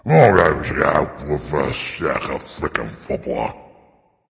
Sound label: grumble dragon; fafnir